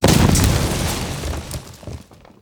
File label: Hit Effect Building; 1944 Battle Of The Bulge